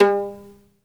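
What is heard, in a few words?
SOLO VIOLIN, Synclavier Strings; ILIO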